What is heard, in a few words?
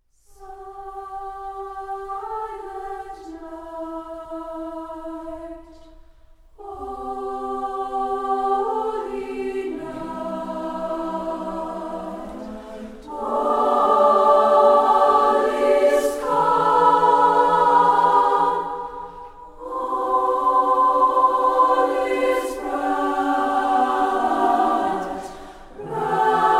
SATB; Silent Night